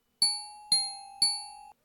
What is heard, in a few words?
Diving finch